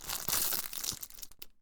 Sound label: sweet bag open